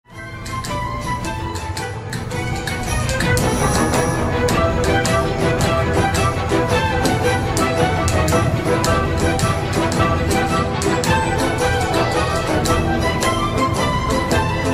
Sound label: Music; Tiragarde; WoW Battle for Azeroth; BlizzCon 2017